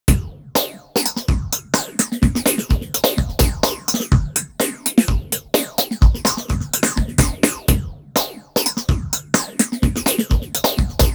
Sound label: up down drums; u-he uhbik